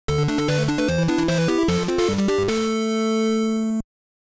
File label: victory